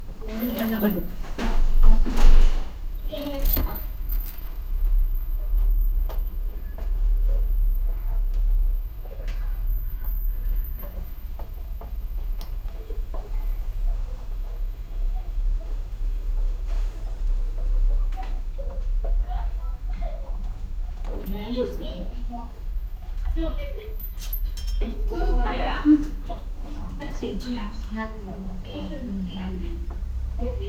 misty night outside a, hotel

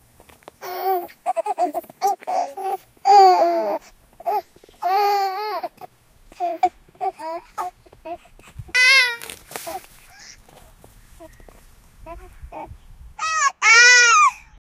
baby laughing and snowman; children

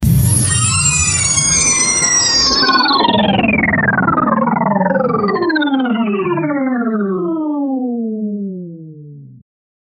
the effect of moving from, funny

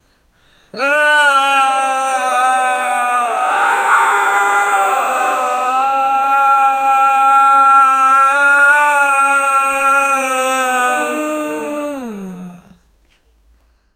man crying passionately; human emotions

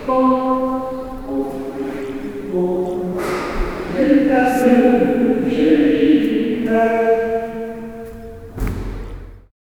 group of people are going, mass

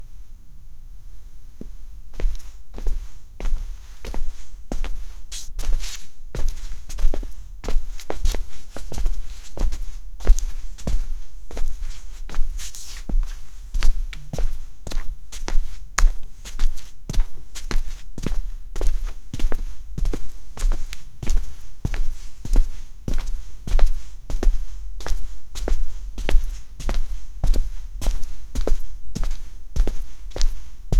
footsteps on carpet

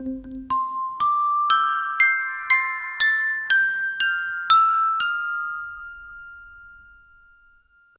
gentle mystery theme xylo; mood

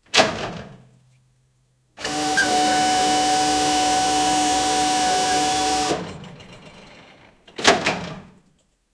forklift hydraulics lower, lowering

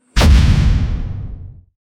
cinematic air burst deep

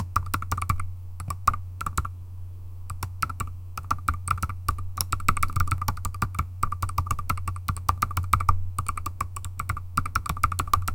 a light and melodic typin

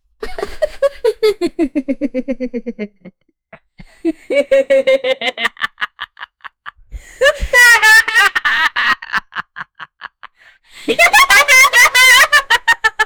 laughs like mental, character voices; rarity

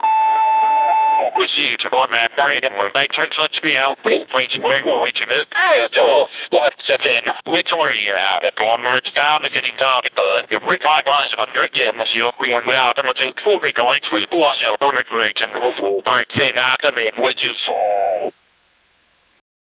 create a strong opening b, broadcasting

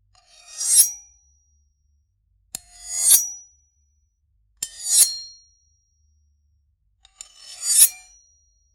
sharp metallic knife unsh

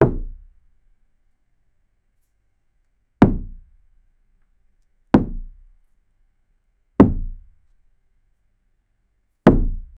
like the ball hitting the, tennis

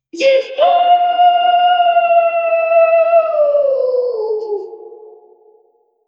meme cry of the soul; chat

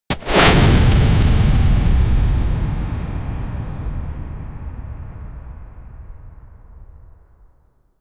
sound of a flaming arrow, crossbow

